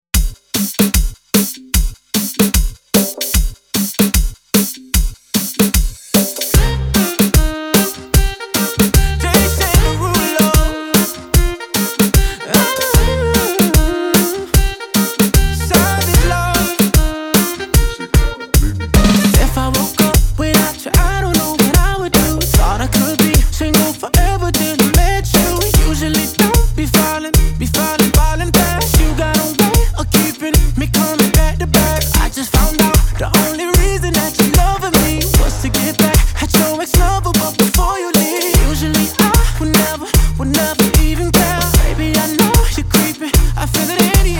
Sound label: siren beat bpm 150; funkymix vol 257; kwikmix